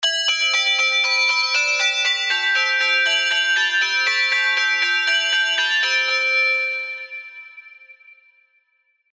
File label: Holiday Bells; tropical pops; passionfruit